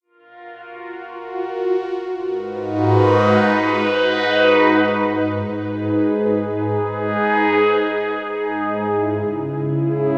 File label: ps3100 strings resonators